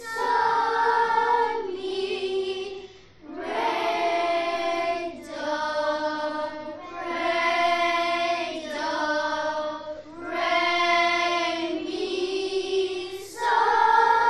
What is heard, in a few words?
Sing pentatonic solfa teacher points to vertical Note Ladder